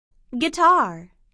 guitar

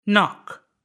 knock

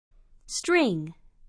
string

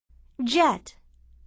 jet

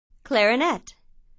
clarinet

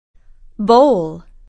bowl

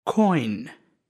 coin

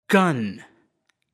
gun

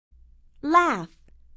laugh

